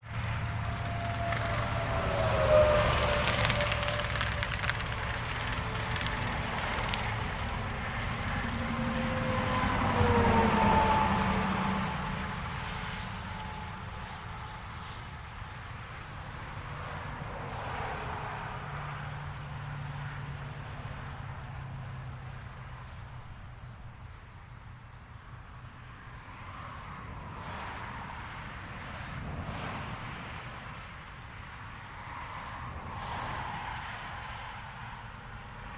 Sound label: USA Interstate 80